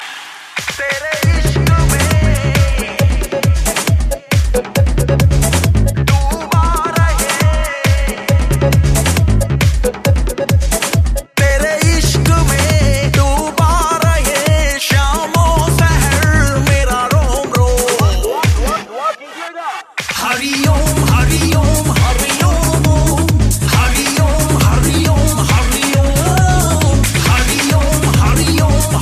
electro mix; karzzzz

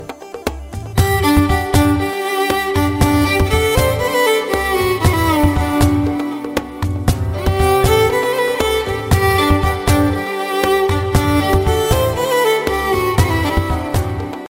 violin; om shanti om

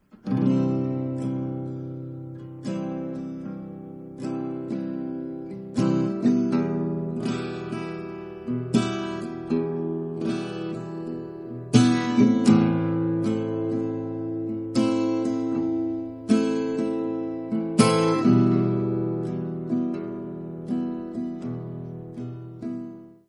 romantic

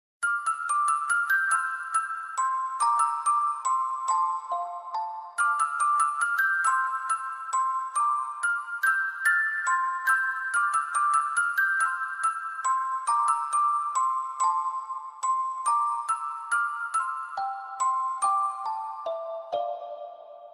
music box; pandora hearts

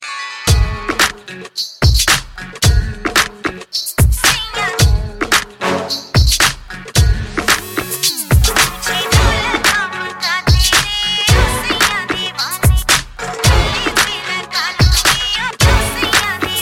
bolywood mixed

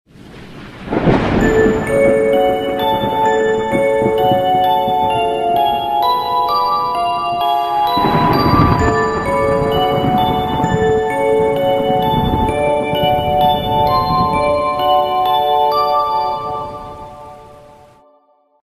creepy music ringtone

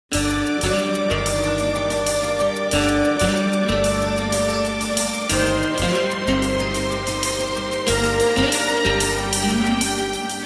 guitar and whistle mix

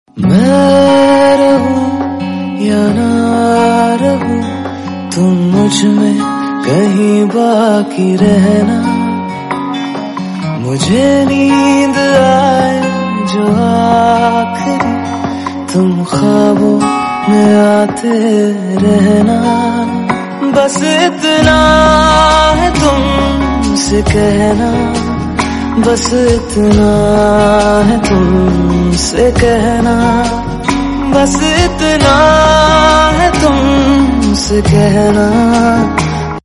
hindi song

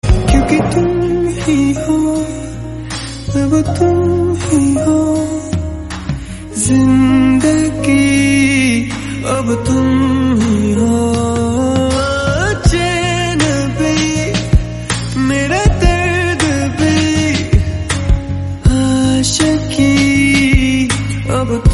best ringtone

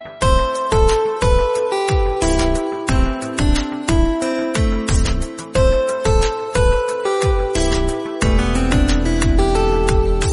very nice romantic

